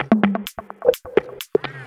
Electro Loop 128 BPM; Vengeance Samples

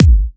Hard Kicks; Vengeance Samples

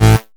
Offbeat Bass A; Vengeance Samples

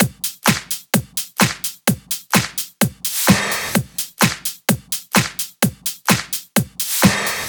128BPM Dogde Kit

